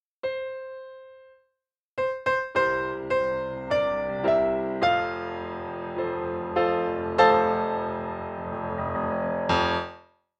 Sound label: Melody